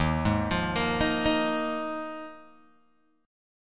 Eb Suspended 2nd Suspended 4th, Guitar, Open G

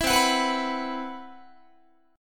C Major 7th Flat 5th, Guitar, chord; CM7b5; strummed; 4A,4E,5A,5D,5G#,6C#; 19,20,21,21,22,22

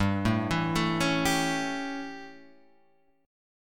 A 7th G, chord

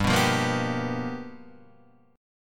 G 7th Sharp 9th G, chord; G7#9 Chord {3 2 3 3 0 3} strummed

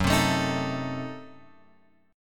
F sharp Diminished 7th F sharp; F#dim7 Chord {2 3 X 2 4 5} strummed

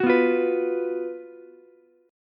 Gb Major 7th Suspended 4th Gb Ukulele; GbM7sus4; strummed; JGuitar

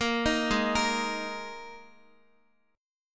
Ab Suspended 2nd Guitar; arpeggiated; Absus2; x,x,8,8,8,5

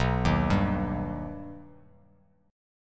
B Augmented B down; arpeggiated; JGuitar

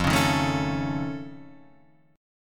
F Augmented 7th F, chord

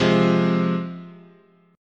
C Suspended 4th Sharp 5th, guitar chord

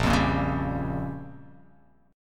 A sharp Minor 6th A sharp down, chord

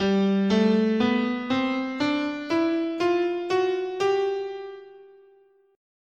G Bebop Dominant, scale

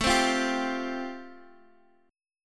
A Minor 7th Sharp 5th A Guitar DADGAD, chord; Am7#5; strummed; chordshape; x,x,7,5,8,5

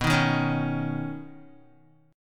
B Major Add 9th, Badd9 chord, Guitar; strummed; JGuitar

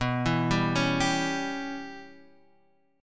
G 6th Flat 5th B

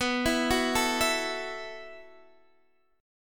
B 7th Suspended 4th B down Guitar Open D

